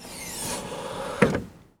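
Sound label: BMW HOOD open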